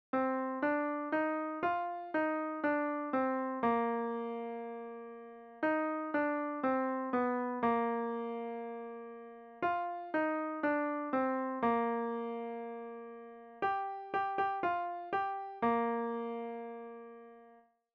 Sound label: T Rocks B Countermelody